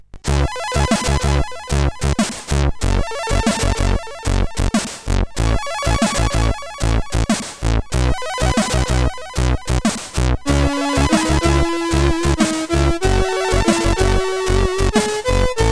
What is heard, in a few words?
End Tune, sid CSG8580R5